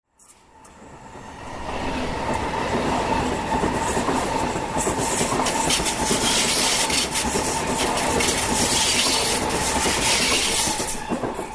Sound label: pendolino